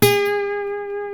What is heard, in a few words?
A 4 MARTIN 6, Guitars